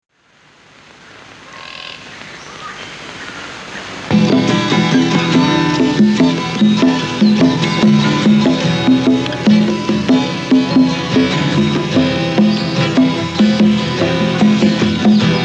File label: Instrumental